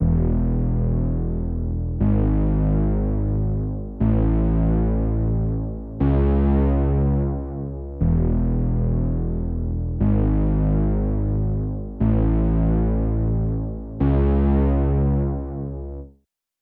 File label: 120 BPM C# Min Bass, RnB; Melodies; Loop Stems; Cymatics; GHOST (Beta Pack); Attachment